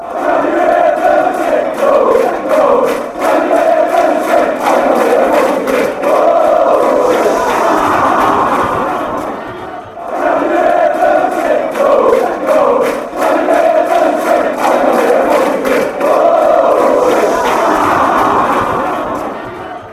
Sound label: can u hear the burnley sing fanchants